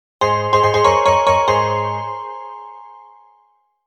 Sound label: obtained an item; pokemon x y